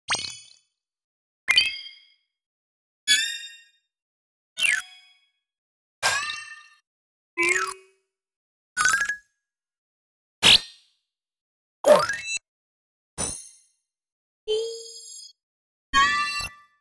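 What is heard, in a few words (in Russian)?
звуковой эффект обратной связи по нажатию кнопки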